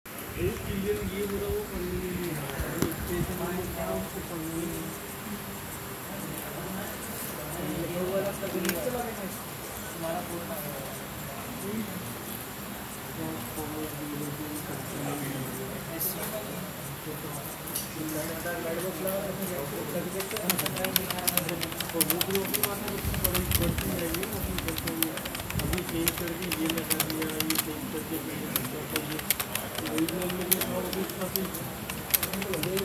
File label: karaoke recording